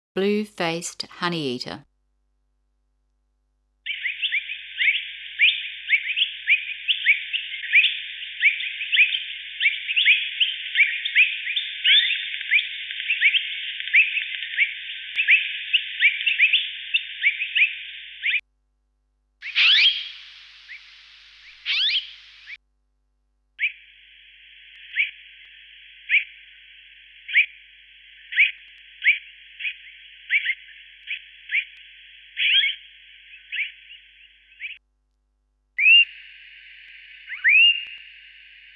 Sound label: Blue Faced Honeyeater